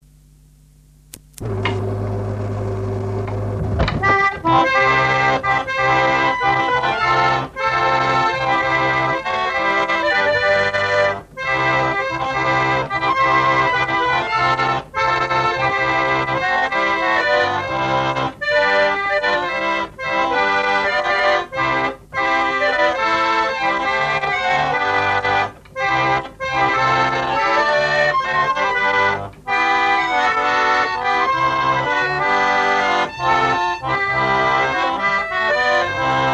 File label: barrel harmonium